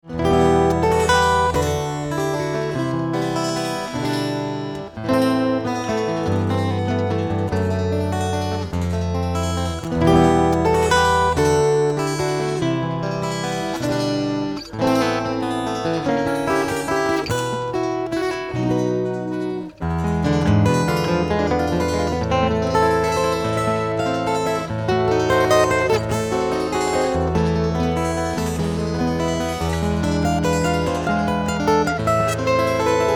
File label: Duet